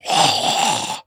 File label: Grave Zombie Attack